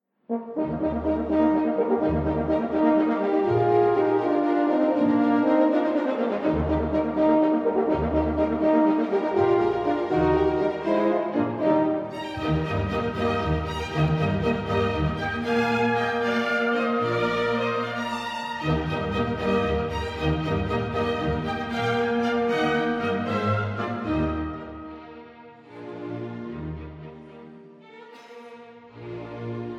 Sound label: Concerto for 2 Horns in E flat Major, Rondo; Allegretto